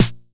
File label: Snare; Drums & Percussion; One Shots Drums